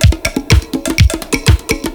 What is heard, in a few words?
JUNGLE, BREAKBEATS; Crypton - DrumBase5000